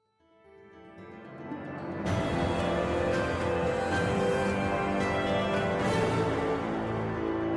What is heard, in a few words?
mission accomplished; mass effect 2